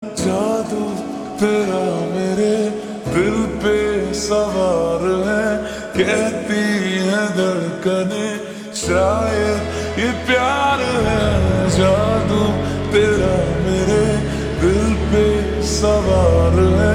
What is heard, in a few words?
Slowed Reverb Ringtone; do patti